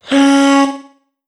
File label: Vocal one shot Db; Slate Digital Omnivox Vocal Sample Pack